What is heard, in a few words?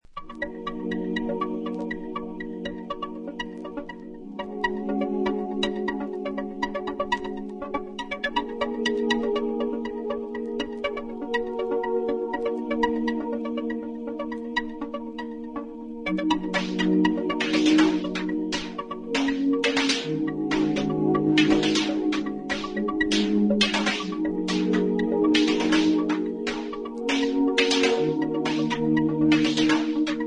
AMBIENT EXPERIMENTAL